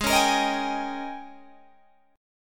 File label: Ab Major 7th Suspended 2nd Suspended 4th Ab, down, Guitar, Open G